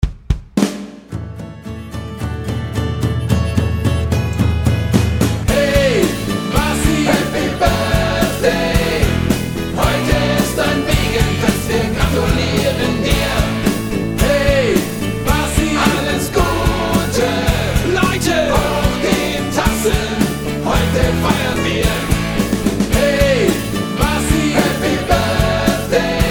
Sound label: Happy Birthday Bassi